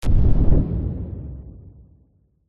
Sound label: deep laser blast